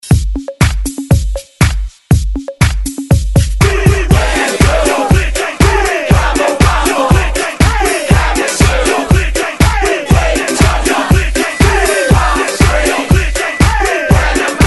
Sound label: Hype Re Drum 120Bpm; DJDOLLS